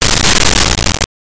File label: quick zap